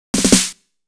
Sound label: Fill, Snare Fills; Drums